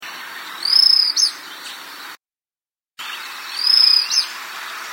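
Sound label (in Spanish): Piojito Picudo, Inezia Inornata, Plain Tyrannulet; cantos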